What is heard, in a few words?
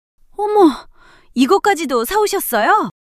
surprise